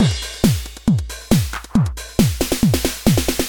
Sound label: drum